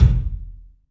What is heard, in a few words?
KIK, LIVE CLUB KK, Drums; 3D Sound